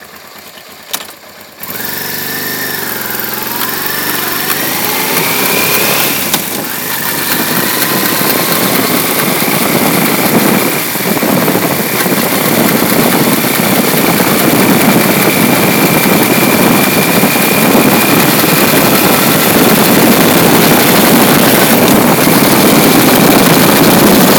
phone near clutch, XL600V maakt een zorgwekkend geluid in het vooronder; Transalp